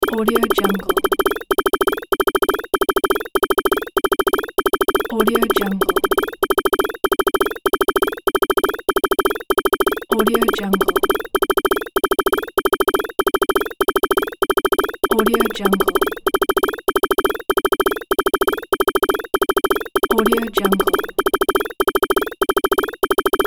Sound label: jackpot score tally